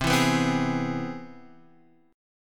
C Augmented Major 7th C down Guitar Half Step Down; chord